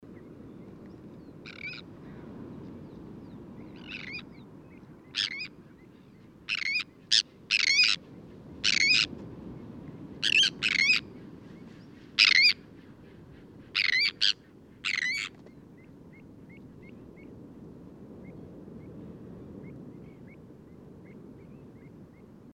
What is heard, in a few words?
swtern sandvicensis FC FL; cley